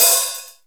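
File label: Roland R-8 open hi-hat; Internal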